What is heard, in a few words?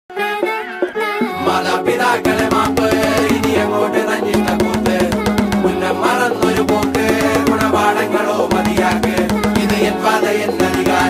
song malayalam; avesham movie